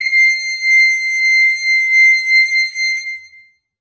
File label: brass acoustic, muted trumpet